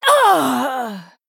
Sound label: Female Blood Elf Death